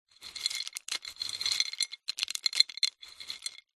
food savory snack wasabi peas in ceramic bowl hand in movements; zapsplat